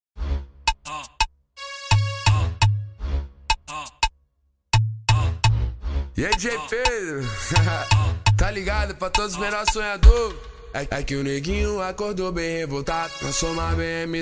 graves bass

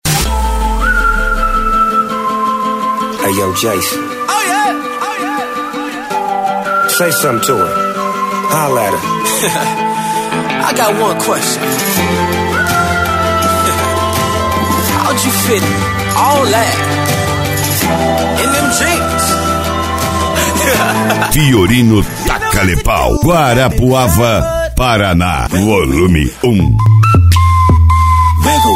especial funk e electro house

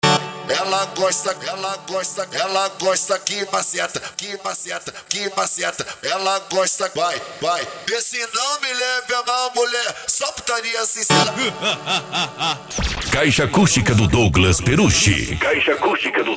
especial funk mega bass

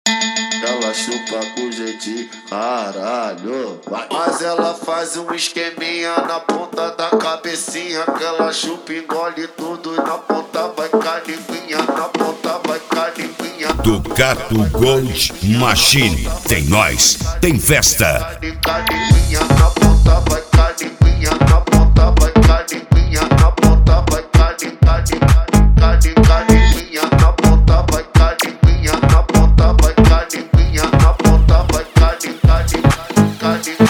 eletrofunk

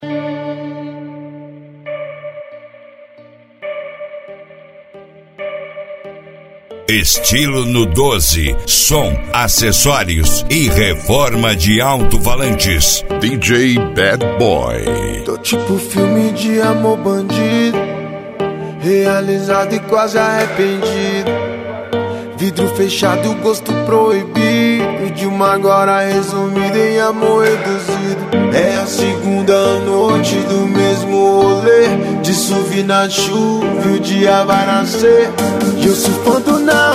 funk conciente e hip hop nacional

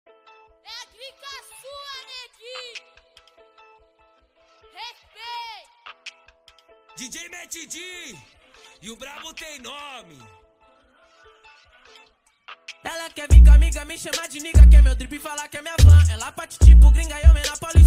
trap br graves bass

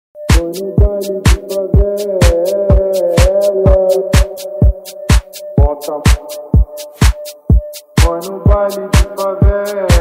eletro funk; balada g4

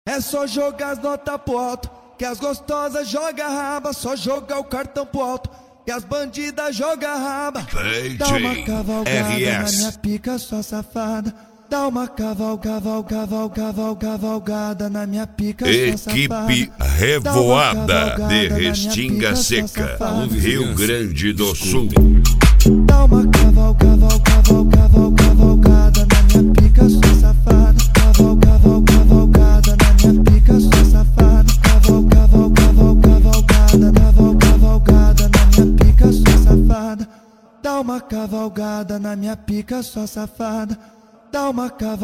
eletrofunk; cd equipe revoada especial fim de ano

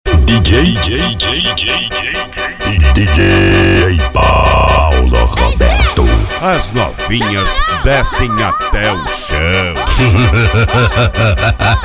eletro funk; cd na batida do eletro funk

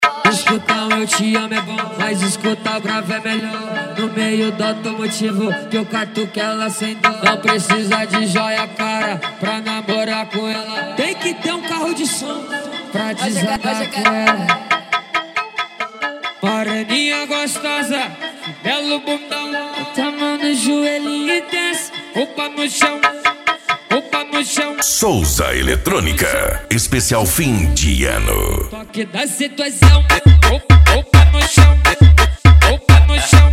edicao automotivo; souza eletronica

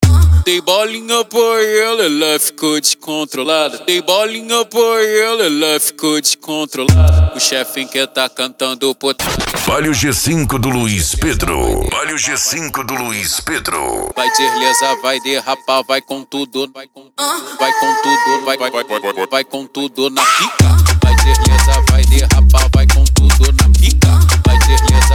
funk mega bass e forro; urupa ro

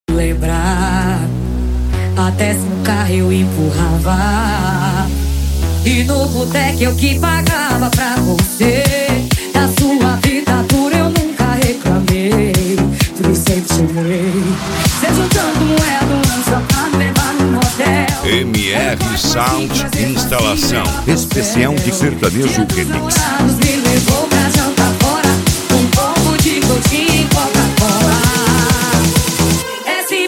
sertanejo remix

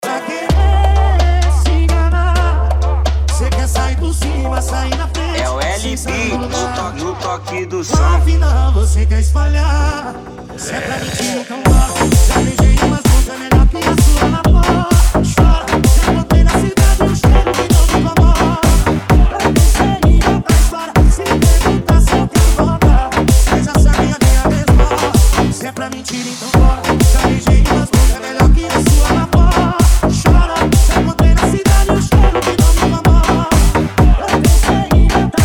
sertanejo remix

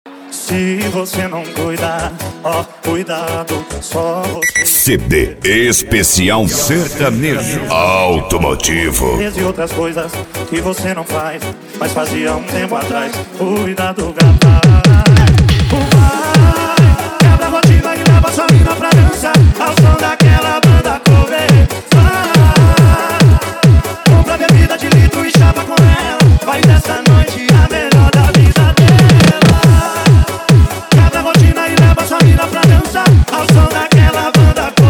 sertanejo automotivo

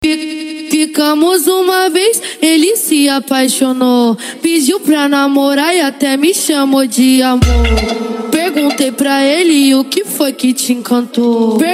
funk mega bass e flash back

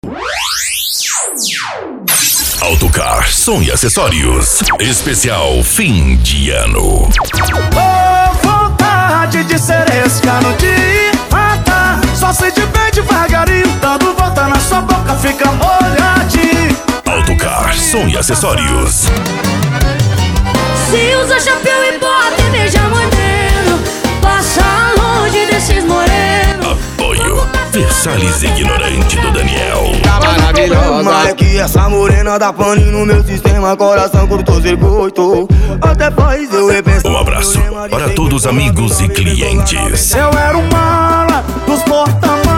sertanejo e lambadao

